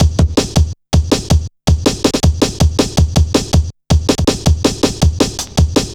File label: Boy, Drum Loops, Jungle, 161.5; Zero-G Jungle Warfare 1, 2 & 3